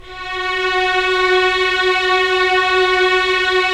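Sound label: Strings Violins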